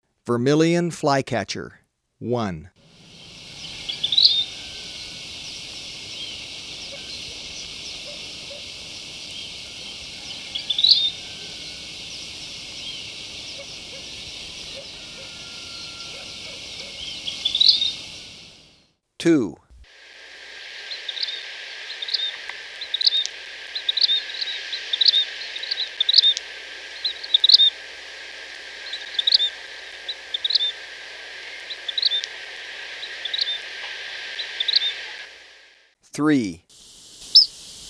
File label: Vermillion Flycatcher; S. America